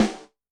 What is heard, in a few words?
Fat b rim, Yamaha DTXpress-II snare